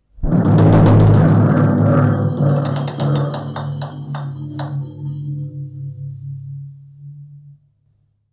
Trống Chầu và Lục lạc; Trống Chầu trong các Dàn nhạc; Dàn nhạc Sân khấu Tuồng

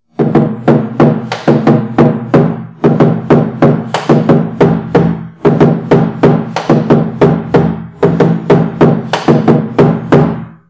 Trống Ngũ liên

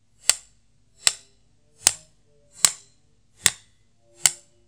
Sênh tiền, Lắc, lắc giữa hai thanh phách tiền; cuối một bản nhạc hay cuối một nửa đoạn thường sử dụng kỹ thuật lắc giữa hai thanh phách tiền

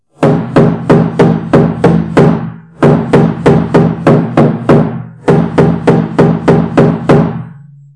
Trống Sư tử

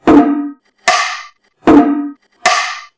Tùng-Cắc: Trống hồi thường đánh trước to và chậm, dần dần đổ hồi thì nhỏ và nhanh lên

